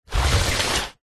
cardboard box parcel heavy slide wood deck external; zapsplat